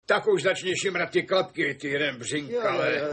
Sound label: piano; petrolejovy princ; dabing